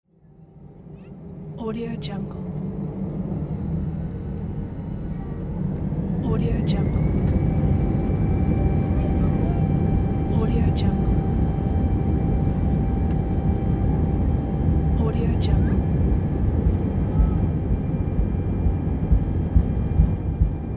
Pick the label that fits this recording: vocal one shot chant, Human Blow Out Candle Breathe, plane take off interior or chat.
plane take off interior